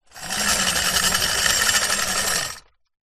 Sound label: robot android metal old roll wood surface; science fiction